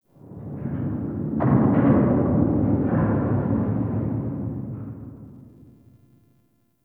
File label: Distant Eerie Metal Bangs; Ambience; Warframe; Nineteen Ninety Nine